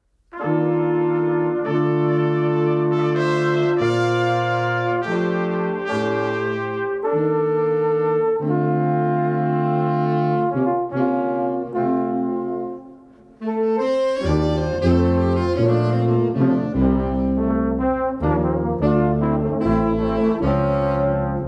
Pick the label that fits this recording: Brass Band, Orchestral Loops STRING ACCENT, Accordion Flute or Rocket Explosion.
Brass Band